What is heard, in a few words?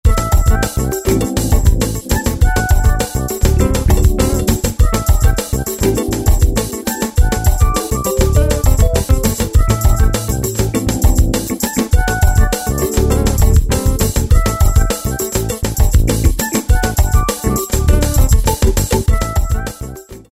Jazz Funk Pattern, Roland MC-303